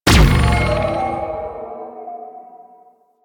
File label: Overguard Pulse Repeat; Warframe; Infested; Ancient; Protector Ancient